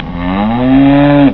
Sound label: bull pain; svencoop; hunger